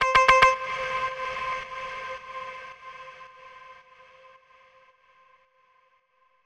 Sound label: Guitar Lick G# 110Bpm; House; Ghosthack Advent Calendar; House Kit; House Construction Kit